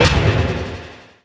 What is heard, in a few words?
astral start; void spirit